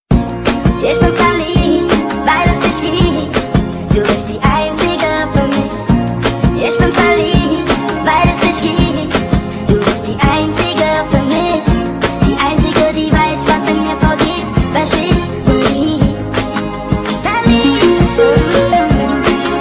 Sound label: lovesong; jamba